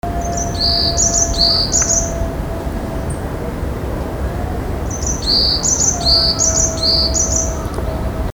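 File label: yamagara, varied tit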